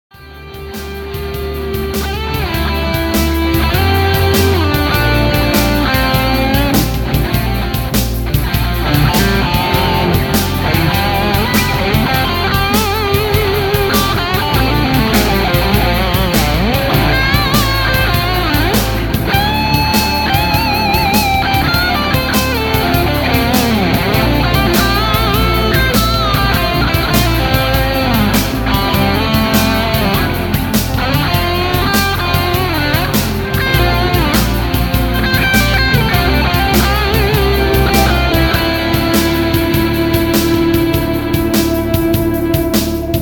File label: blues